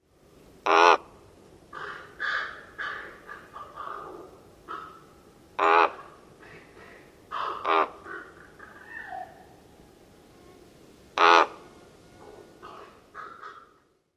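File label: Common Raven low honks near nest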